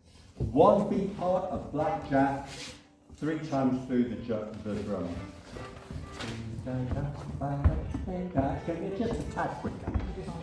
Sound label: Drummer new arr